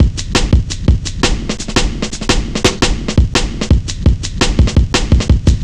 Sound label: 170, Drum Loops, Drum & Bass